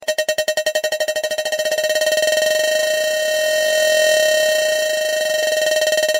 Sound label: Sms, SMS Tone